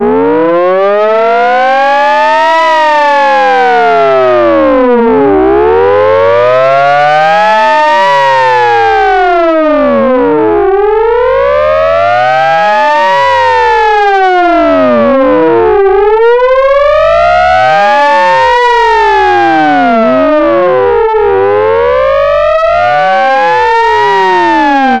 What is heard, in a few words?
fire truck piercer siren